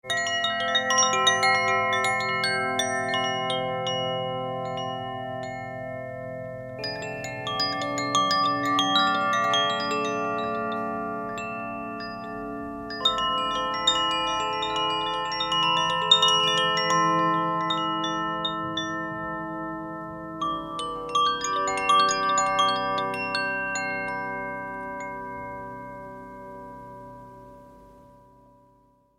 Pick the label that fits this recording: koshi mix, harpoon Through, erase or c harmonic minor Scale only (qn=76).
koshi mix